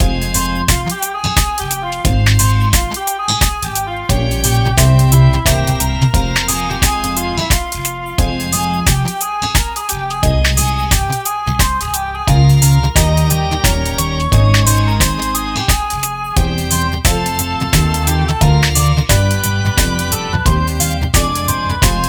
sonate f minor 1st m; telemann

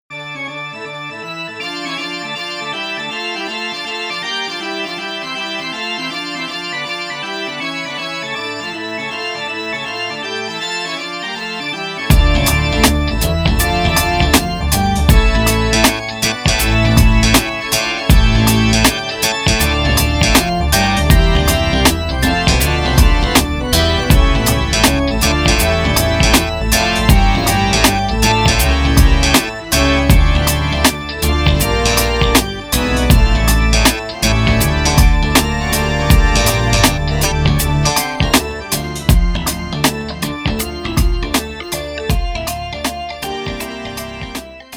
Toccata D Minor; Bach

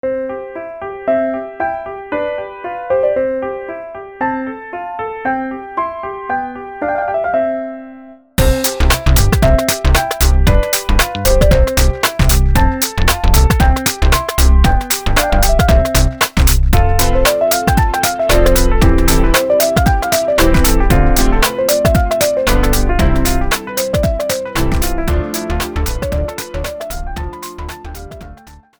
piano sonata no.16 1st movement; Mozart’s Piano Sonata No.16 1st movement

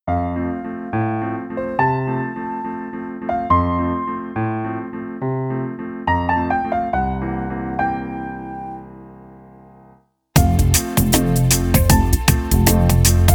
Piano Concerto C Major; Mozart; No21; KV 467

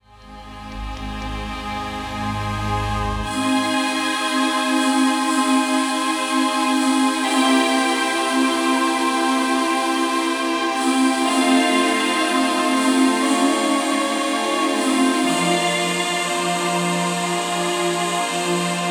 music for relaxation; liszt

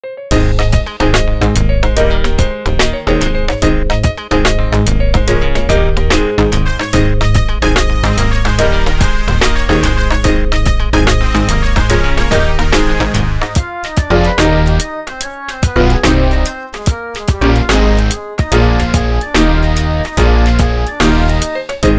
piano sonata a flat 3rd m; Haydn’s Piano Sonata in A flat major 3rd movement

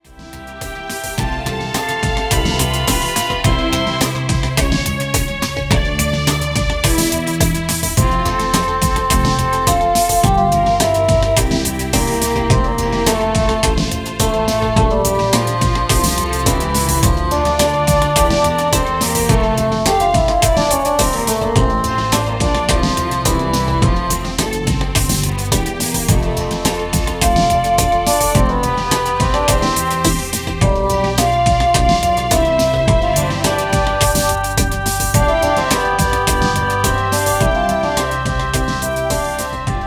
concerto for bassoon b major 2nd m; mozart

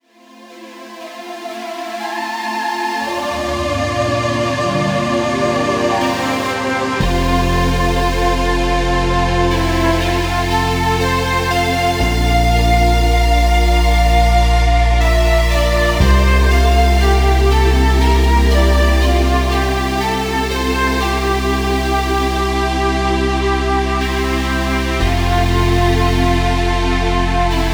relax music; schumann

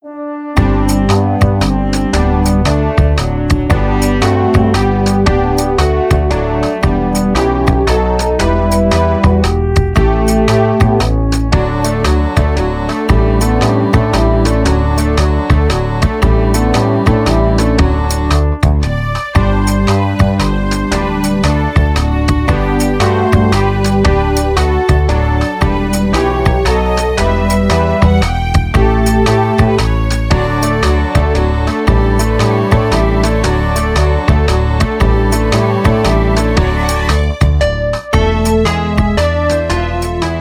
Minuet G Major; Mozart

